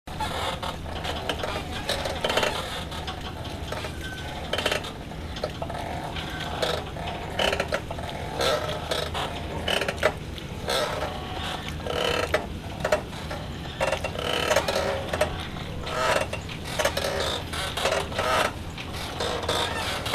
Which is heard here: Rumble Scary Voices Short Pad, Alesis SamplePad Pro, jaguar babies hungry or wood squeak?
wood squeak